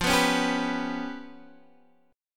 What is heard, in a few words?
G 7th Flat 5th